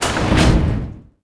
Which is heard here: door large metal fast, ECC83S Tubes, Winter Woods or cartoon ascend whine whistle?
door large metal fast